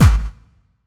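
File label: snare drum bass drum, Dance Mega Drums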